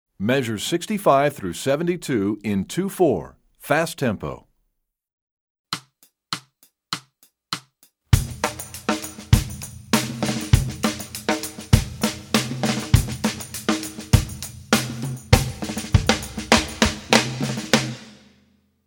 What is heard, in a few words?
Fast Two Four, Percussion; EE2000 Book1